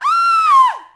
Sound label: girly scream; female characters; AoM-ARTofMyth